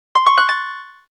harp, keytone

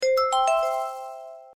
close

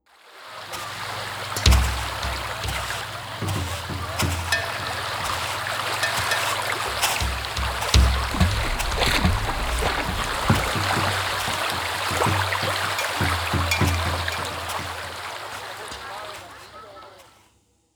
Beach II w Perc; Sony Ericsson; C901; PlusFourSix; Lars Edvall

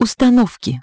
menu; Hyundai H-MP718

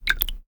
Unlock, Samsung Galaxy S3

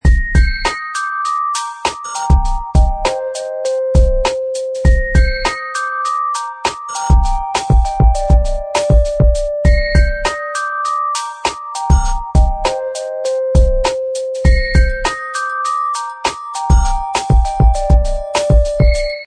Ring Lounge; Asus P526

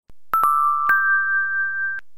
Success

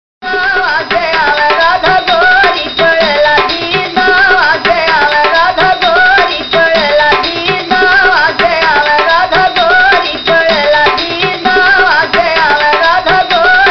Vocal Loop; Retro Ringtones

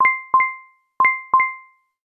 moisture error, phonetones, ui; LG X power 3

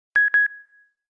Failure; LG KF900 Prada II

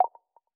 Video Record, ui

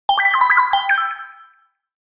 talkative, notifications

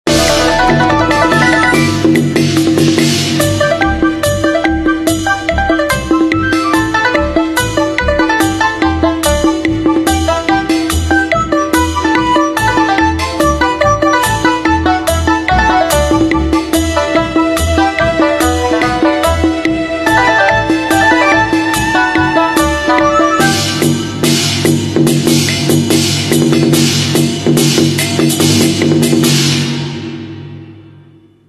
Cheerful, ringtones; Nokia; C1-02 China

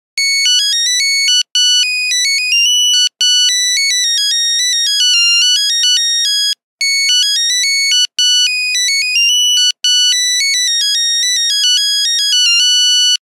Menuet, Nokia Buzzer; Windows Phone apps

